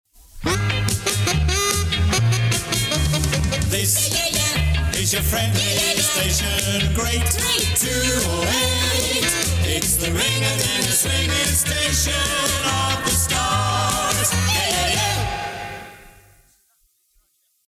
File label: jingle